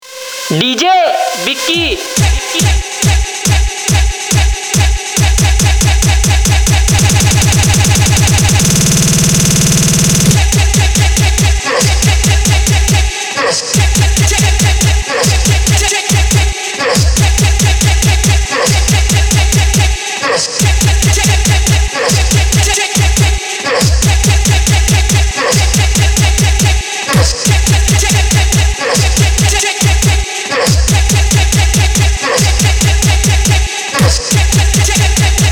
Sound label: EDM And Trance Bass Mix; Full EDM And Trance Bass Mix